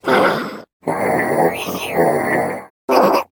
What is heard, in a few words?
Rat Monster Attack Pain Death Noises